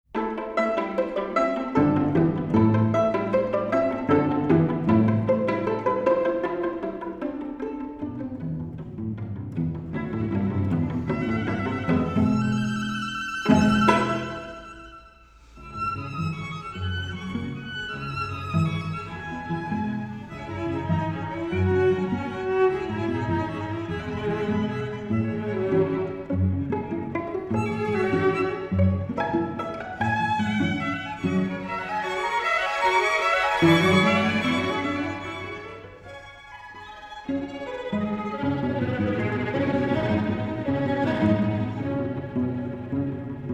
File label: String Quartet In F Major; Second Movement